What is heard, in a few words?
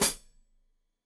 Hat Foot, Hi-Hat, Cymbals Stereo